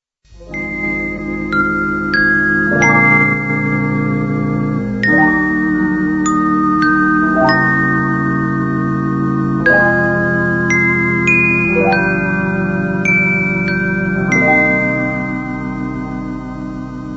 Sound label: interval signal; ur kharkiv oblast